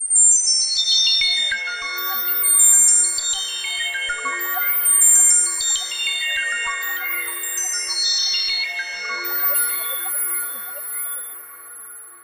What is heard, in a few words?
spooky arp